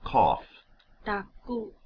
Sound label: cough